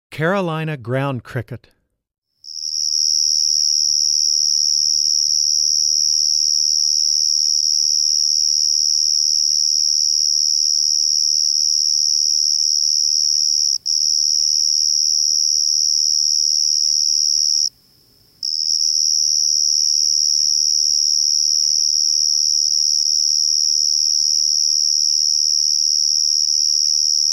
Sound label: Carolina Ground Cricket